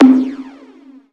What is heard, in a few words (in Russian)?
knopka suhaya odinochnii dolgii yarkii